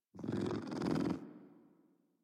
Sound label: Kitten Growly Purr; Warframe; Catbrow